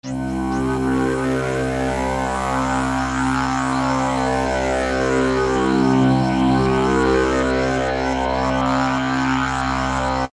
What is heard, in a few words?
Lead Synth